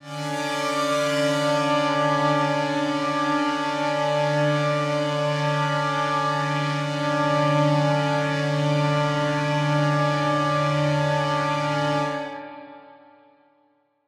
One Shot Drone D, dystopian drone; One-Shot Drones

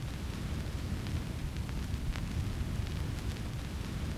Tape Noise; layering

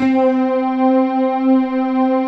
MUTE PNOS, JV PADS; Pro-Rec - Synthsonic

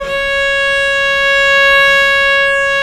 VL C#4, VIOLE, Historical Instruments; USB Soundscan vol.23

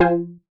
stab taut, stabs

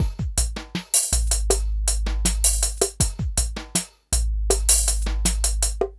PHATT BEA

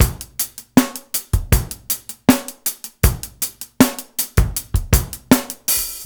LOOP 79; AMG Gota Yashiki Groove Activator

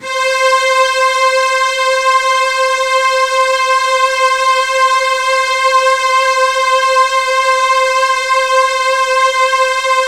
C5, Synth Line; 90 s Sample CDs; NiceBeats; SynthLine VOL-15

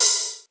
Open Hihat

